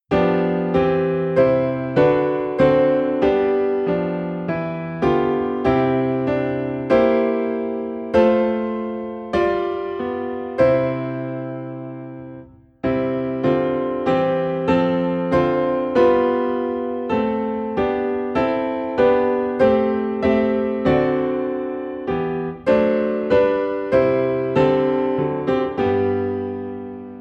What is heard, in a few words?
Piano in C; May Jesus Christ Be Praised